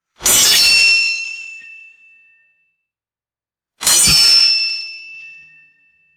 sharp metallic sword clashing hits; weapons

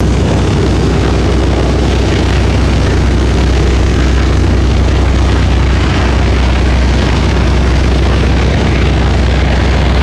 vintage bomber aircraft engine idling

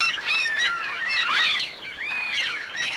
harsh raspy apostlebird squawks and calls

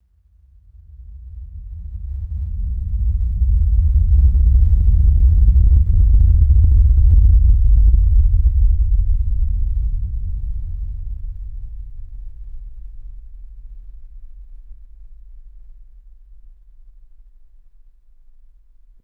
deep ominous low frequency rumble swell; swooshes

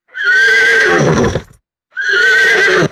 short complaining horse whinny; animals

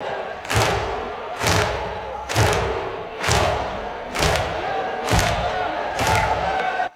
rhythmic crowd stamping and clapping indoors

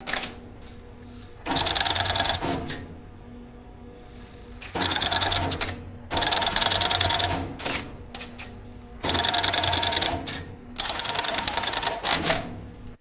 rapid mechanical telephone exchange clicking

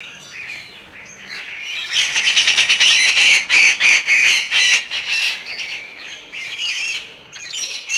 flock of cockatoos screeching and chattering; birds

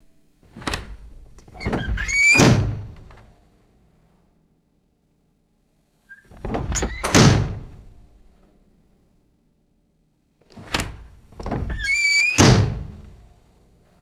squeaky hollow door opening and closing

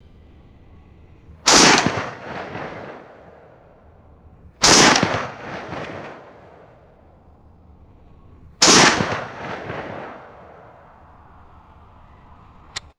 distant single rifle shots outdoors; guns

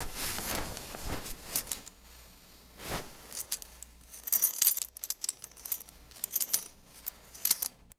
thick belt rustling and buckle clinking; cloth

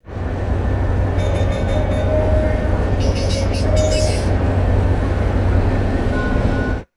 two tone train conductor call chime; trains

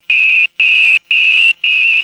slow alternating electronic timer beeps

